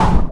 Lectro Snare

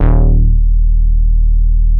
DETUNE BASS, VINTAGE VOLTAGE; Masterbits - Vintage Voltage; VV BASSES

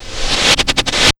TECH 140 FX, Big Beat Drumloops

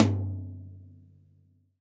TD-20 Tom A, Roland TD-20 Toms

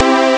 strings maj; Amiga Soundtracker Sample Packs

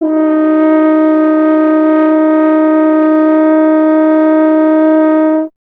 F. Horn; Miroslav Vitous; Solo Instruments VOL-2

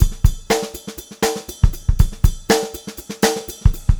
FUNKY, Funk Groove Drumloops

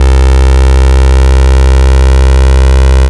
Bass Note C

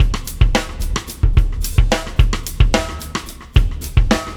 Wormhole Beat, Processed Drumkits

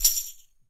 TAM, NYC Percussionworks

